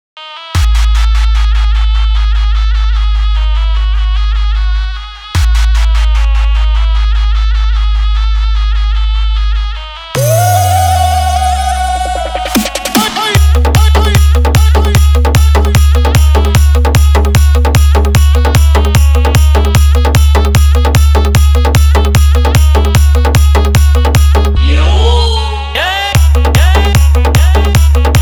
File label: Nagin Theme Music (Matal Humming Dhamaka Dancing Blaster Mix)